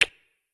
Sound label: magatama kiss